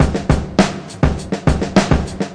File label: 103 bpm oldskool basic, drum loops and breaks